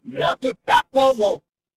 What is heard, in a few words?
feedback level up